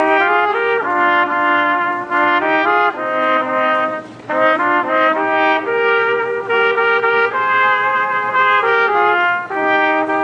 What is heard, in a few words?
trumpet duet; sigma alpha iota members